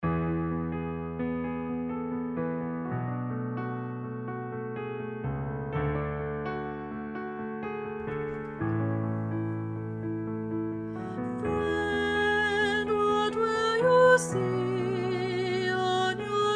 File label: Soprano